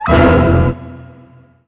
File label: old skool stab